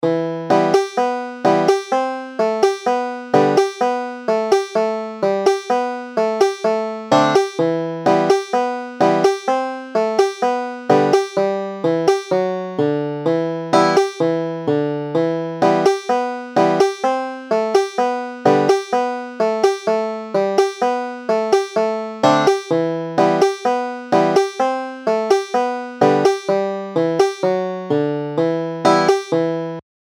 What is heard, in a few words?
banjo